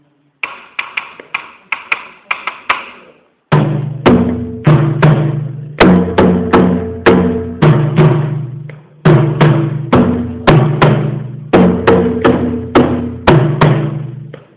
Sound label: Kuku Dunumba Sangban Seuls